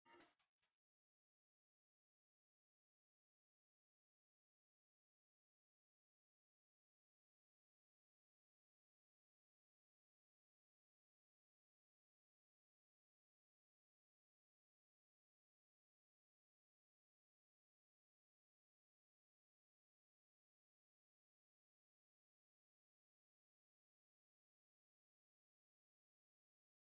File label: Dance Punjabi Ringtone